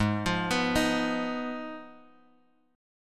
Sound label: G sharp Minor 6th G sharp; G#m6 Chord {4 X 3 4 4 X} arpeggiated